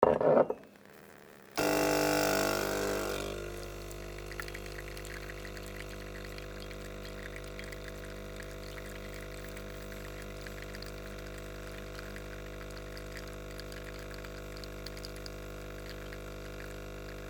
espresso machine

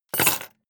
key ring large place table hard

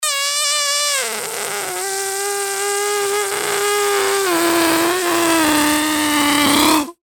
ballon squeek

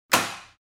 door snap

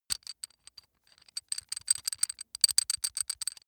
metal screw nut rattle

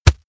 plastic tense bag hard

